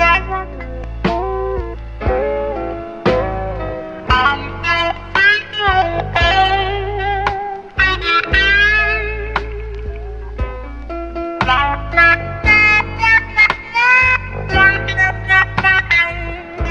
full talking box, Loops, Phonky

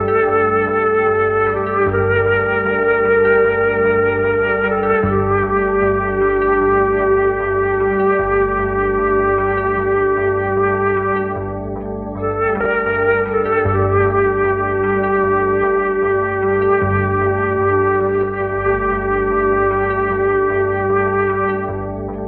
flute with plain key bed, Sad; Loops

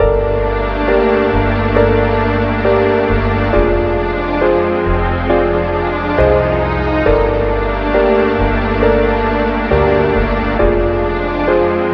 piano, Sad

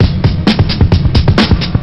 Drum loops Funky

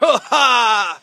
ha ha, Voice

